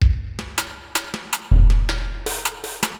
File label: DRUM LOOP ambient 160